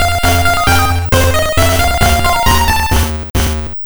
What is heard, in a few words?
Level Complete